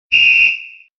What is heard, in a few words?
Coach whsitle